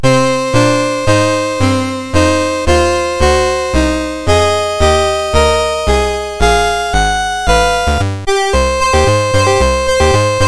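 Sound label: MOS 6581 SID chip; MOS6581R4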